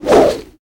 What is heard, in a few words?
fire, smallclaw combat abilities